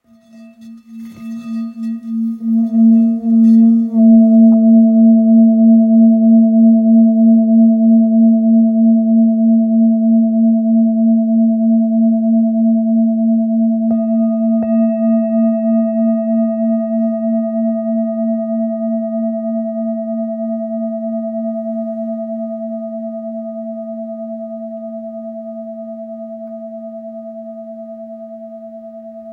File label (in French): Bol grave la diese fleur de vie